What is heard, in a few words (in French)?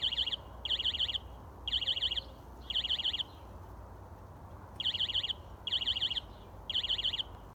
alarme